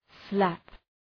slap

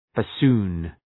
bassoon

